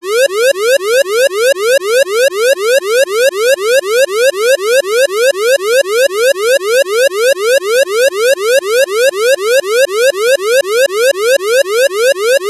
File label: emergency alarm siren